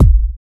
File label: strong bottom end steel kick drum g sharp key, Royality free kick sample tuned to the G# note